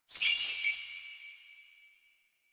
Naginata Whoosh Tonal; Weapons; Melee; Warframe; Koumei Naginata

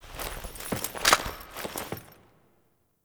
Fixing Computer Interact; Loid; Warframe; Quests; Entrati Labs

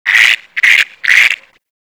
Garden Animal; Warframe; Ambience; Clan Dojo; Dojo Garden Animals

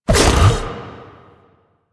Bite Cast; Warframe; Warframes; Voruna

